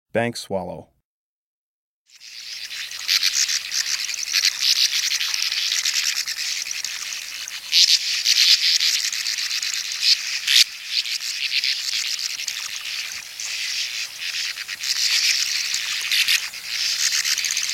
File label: Bank Swallow, Birds of the Chicago Region